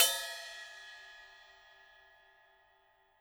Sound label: Trash